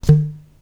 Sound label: alert